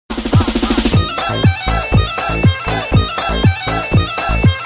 Club Mix; meloboom

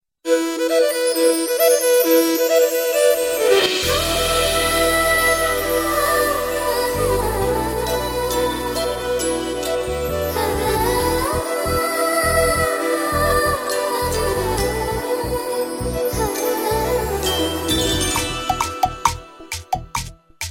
Flute Ha Rhythm

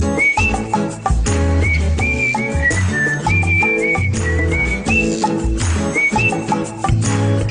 Blackberry Whistle

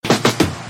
Bass Drop

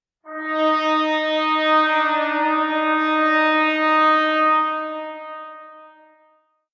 Horn; Nights Watch

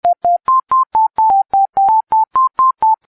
Vodafone Msg

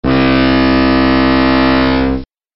Boat Horn

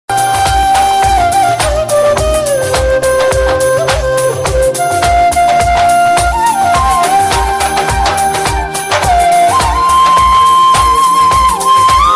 Ultimate Flute

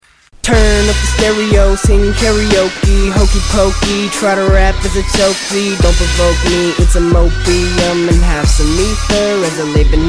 Hohoho

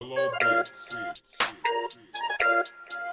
Beat

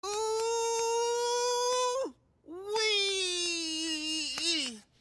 OOOooooooWeeeeee

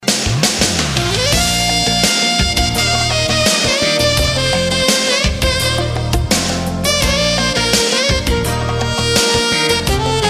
Summer Nite Jazz

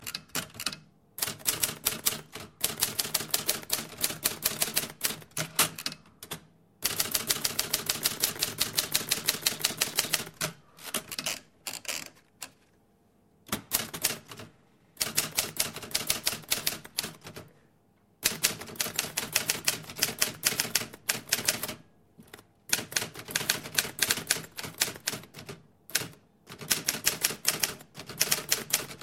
Typewriter

